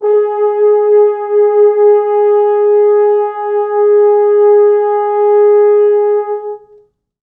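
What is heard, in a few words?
4 French Horns sustain; G#3; East West; Quantum Leap Horns Sax; 4 FrHorns SUS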